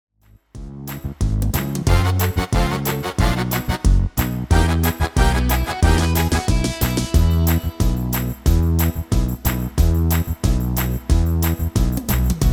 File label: MIDI